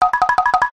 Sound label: cartoon running start